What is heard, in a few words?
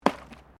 JUNK, Debris